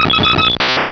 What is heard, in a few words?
cry